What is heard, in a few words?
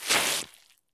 stop asphalt; Escape from Tarkov